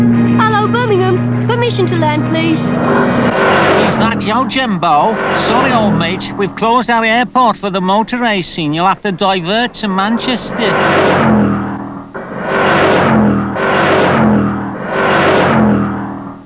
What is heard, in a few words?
racing; classictv; jimbo